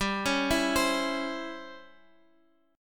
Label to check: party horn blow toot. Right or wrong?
Wrong